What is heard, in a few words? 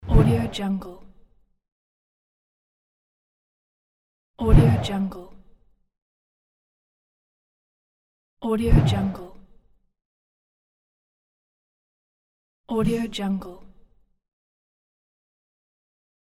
fast swoosh